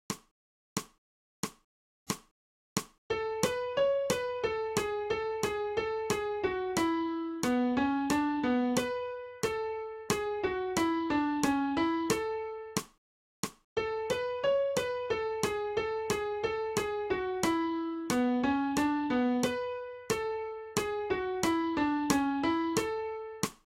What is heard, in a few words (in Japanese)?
新フレットレス